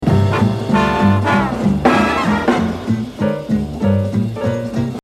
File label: Exercise, jazz drumming; Harold Jones; The Evolution of Jazz Drumming (Gottlieb)